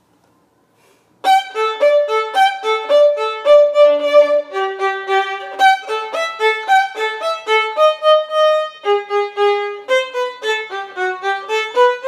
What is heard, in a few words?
Violin